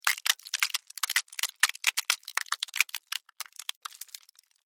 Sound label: Weather Ice Crack Long; Snow